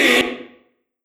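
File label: crowd in Japanese; HeavenStudioPlus; fan Club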